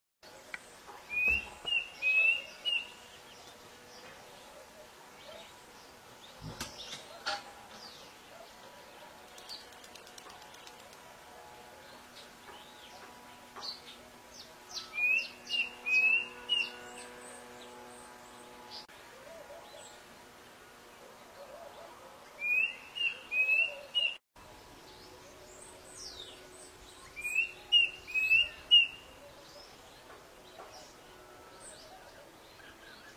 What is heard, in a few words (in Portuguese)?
Canto do cuco bronzeado menor